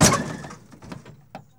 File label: TRUCK JUN, Transportation, Truck Junk